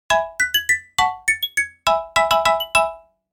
Marimba win success level complete mobile game

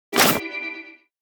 Casino slot machine prize symbol

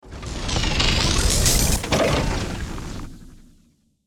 Sci fi door power energy open or close

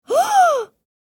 Surprise gasp boy child kid

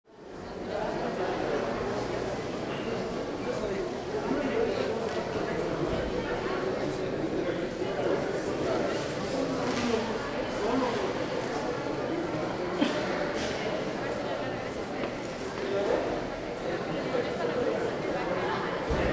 Airport ambience check in crowd chatter Indonesia

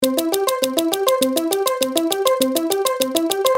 Casino slot machine reel spin loop

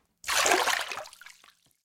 Tiny object dropped in water

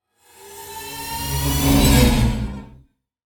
Metal wires transition

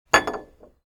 Ceramic plate setting down on a table

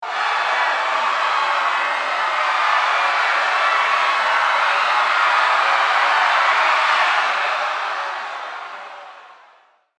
Panic crowd screams disaster chaos

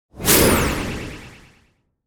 Magic anime spell cast

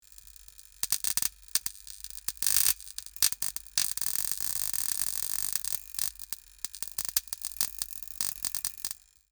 Broken lamp electrical buzz light humming; sound design effects

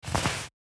Handling jacket noise clothes; clothing

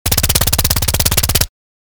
Thompson submachine gun full auto fire; war

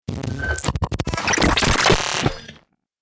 Glitch transition whoosh cinematic short

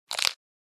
Light bone crack

